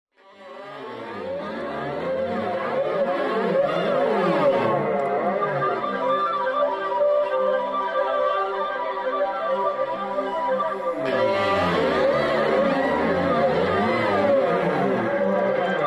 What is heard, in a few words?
Quartet